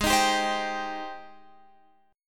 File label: G sharp Major 7th G sharp